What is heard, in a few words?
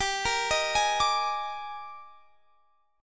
Eb Major 11th G